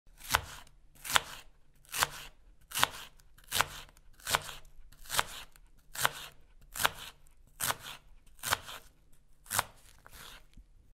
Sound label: Chopping onions